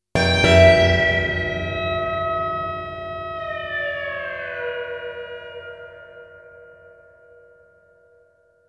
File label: Orchestral Phrase; FXHome